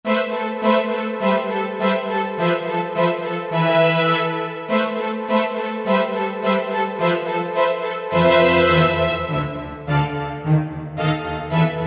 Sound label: Marcato